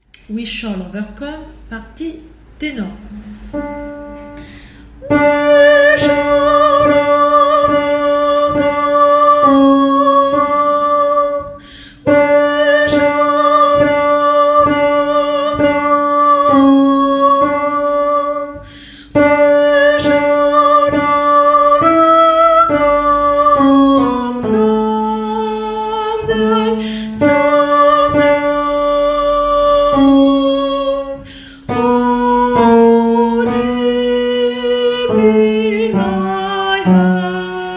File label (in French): ténor; We shall overcome